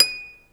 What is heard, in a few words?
concert harp D#6; InVision Interactive; Lightware VOL-1; Instruments & Percussions